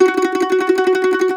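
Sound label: MANDOLIN TREMOLO, Plucked Strings; E-MU Producer Series Vol. 7 – Old World Instruments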